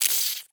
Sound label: Syringe Squeeze Squirt; Medical; Blastwave